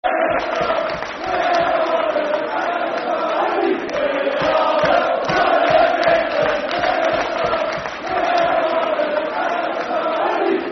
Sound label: Football Chant; We Are The Pride Of The Midlands; Walsall Wfc; fanchants